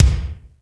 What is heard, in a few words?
D70 BD; Crypton - DrumBase5000